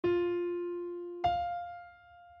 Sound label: up oct